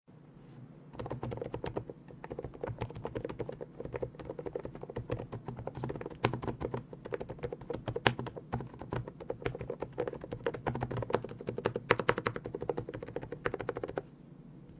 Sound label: creamy sound; epomaker th108 pro